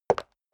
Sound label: step leather shoes concrete single right